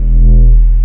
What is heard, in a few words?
hover